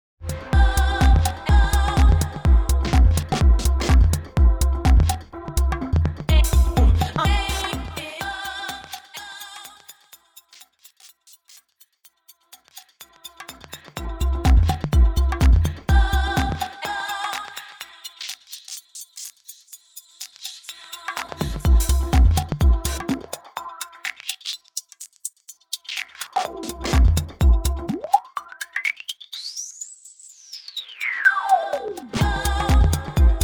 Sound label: DJM-900NXS2 Filter